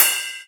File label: Ride, Cymbals; Vengeance Samples